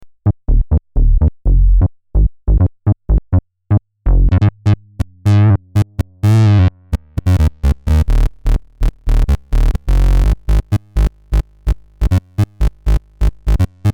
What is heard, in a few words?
HANDPLAY BASS